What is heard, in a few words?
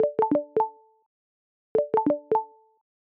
call, ringtones